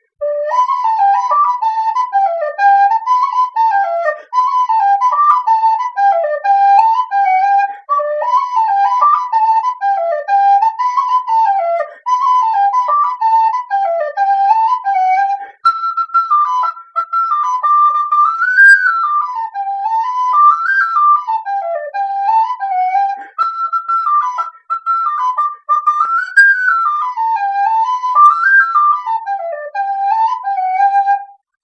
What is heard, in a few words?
ocean sweetone